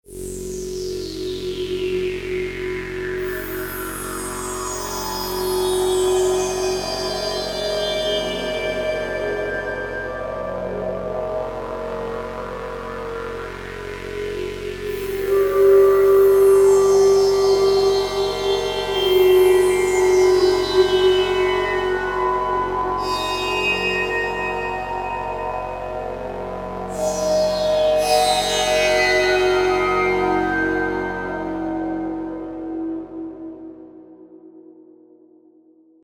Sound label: bp shift 120 wet; Oxium